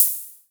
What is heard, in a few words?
Hi Hats Open; LIMAT Div Dept